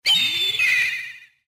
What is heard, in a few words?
hawk Call